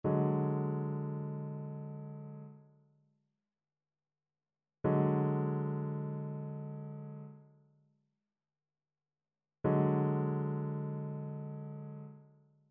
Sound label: trichord; trichord identification